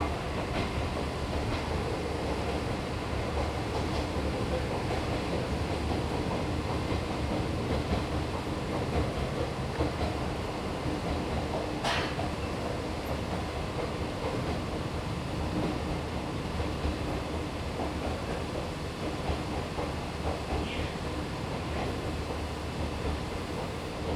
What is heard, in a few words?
train iizaka fukushima